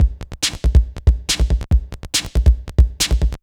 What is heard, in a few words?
electro beats 140